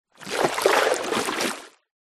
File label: vehicles boat gondola oar movement water; zapsplat